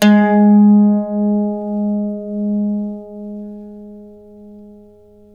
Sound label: g+2, Mandolin; Guitar; Terkelsen